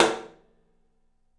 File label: TOM, 3D Drums; 3D Sound